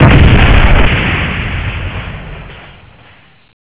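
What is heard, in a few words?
sentry explosion; CSSB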